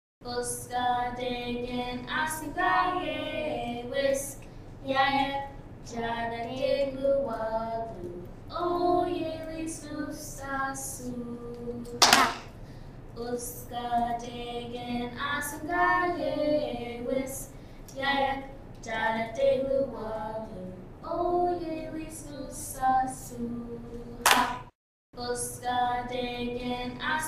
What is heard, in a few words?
counting song